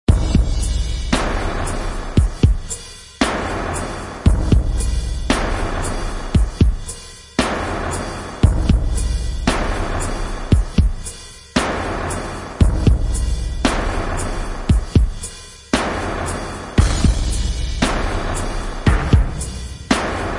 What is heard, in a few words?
Percussion; Fired Earth Music